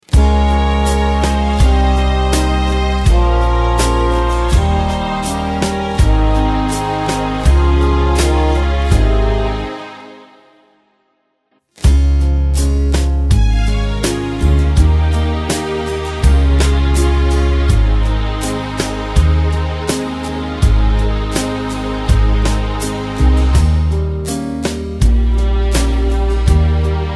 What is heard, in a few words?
Ab No Backing Vocals, backing tracks